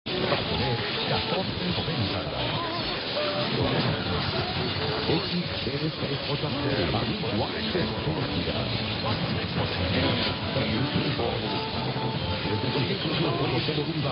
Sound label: Caracol Radio Colombia with Mexican station call, radio; 810 HJCY